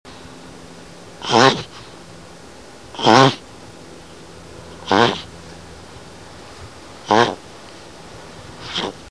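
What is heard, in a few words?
cherepaha golos, turtle voice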